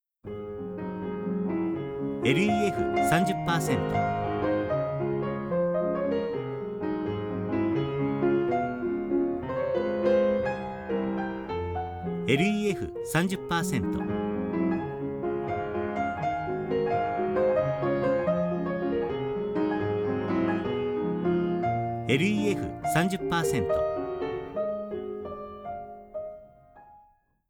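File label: piano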